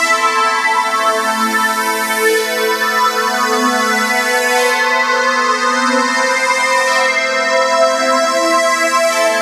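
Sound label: extra lead (string); Sonic Foundry (Sony Creative Software); Ugly Remnants VOL-2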